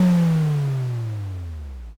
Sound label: dark magic